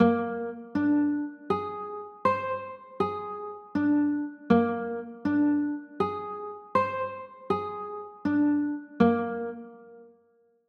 b flat 9, chords

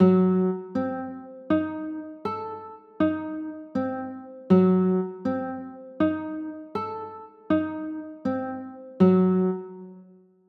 a dim7, chords

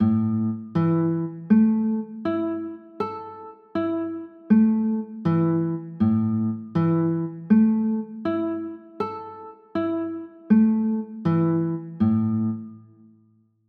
a 6, chords

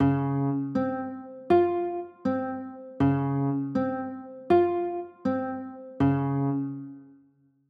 d flat maj, chords; d flat maj7

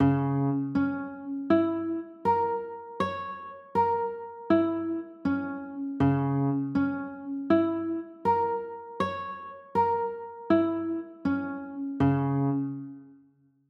d flat m6, chords

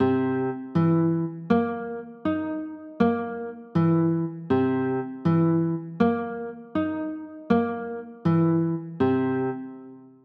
c sharp 6 9, chords; c 7 sharp 9

